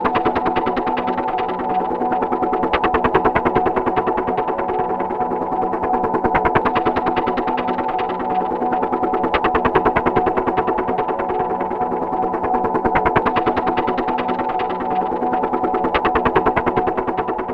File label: MoonWind B, Lunar Drones; Zero-G Seismic Frequencies